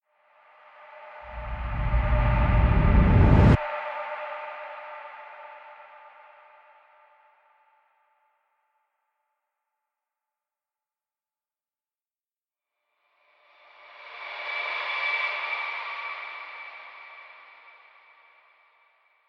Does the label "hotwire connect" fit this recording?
No